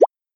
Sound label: logo splash